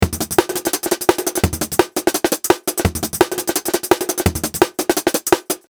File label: drums